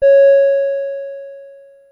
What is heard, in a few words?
Chime; Bosch; Chimes and Announcements